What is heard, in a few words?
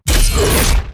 loading; qs-advancedgarages; quasar